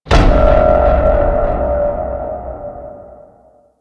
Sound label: Lab Equipment Bang; Warframe; Ambience; Entrati